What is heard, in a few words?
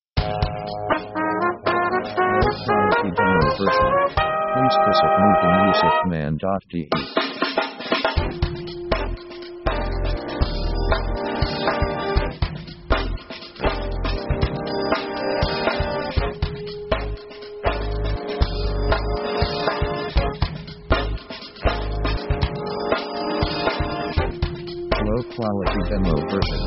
funk action